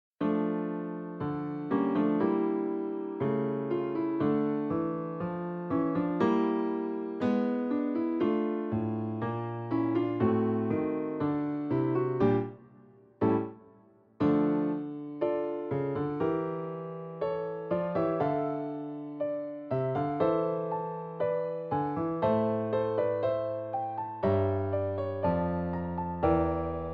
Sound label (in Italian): Allegro per pianoforte; Urlich E. M.